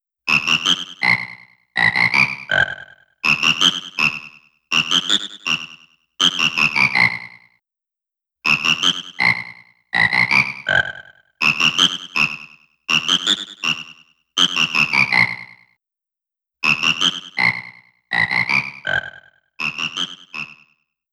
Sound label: Frog Song; The Legend of Zelda: Majora's Mask 3D